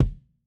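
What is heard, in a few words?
KICK HIGH HIGH KICK, analog recorded drum; A-CONSOLE; A-EQ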